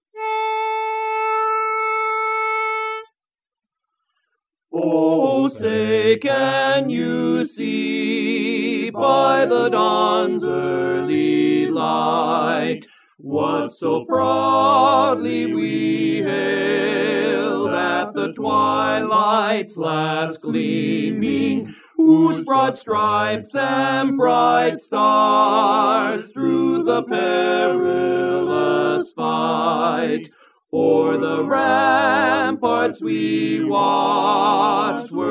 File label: Star Spangled Banner, lead; Barberpole Cat I Learning Tracks